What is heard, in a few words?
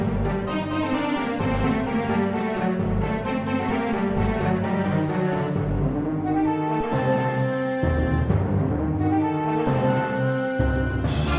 trap